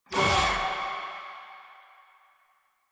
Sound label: Choir Erupt Proj Throw; Warframe